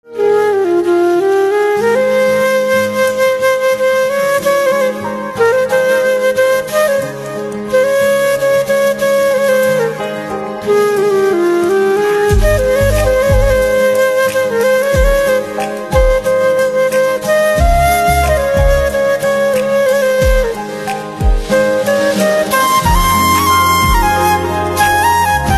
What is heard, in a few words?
Flute Instrumental Ringtone